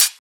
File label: Closed Hat